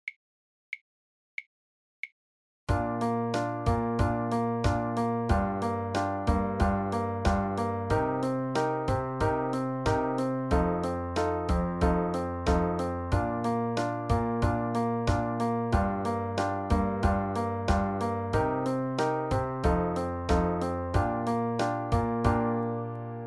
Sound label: Composition Accompaniment